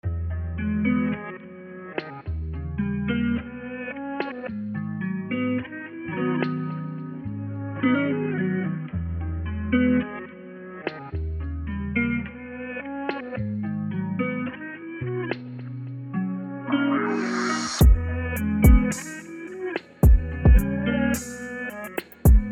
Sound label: 108bpm Abm